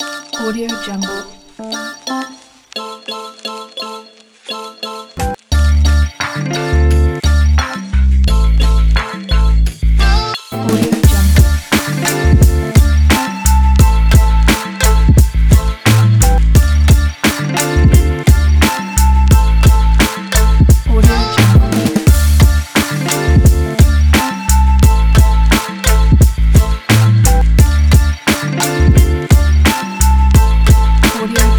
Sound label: gentle hiphop